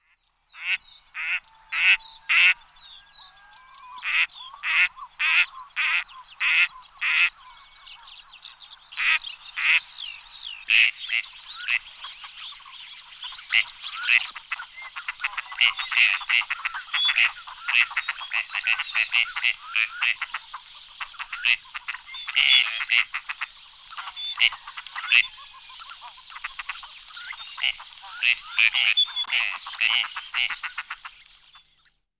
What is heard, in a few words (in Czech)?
Koprivka obecna Anas strepera